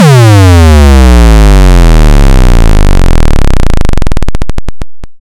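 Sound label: Bass drum long low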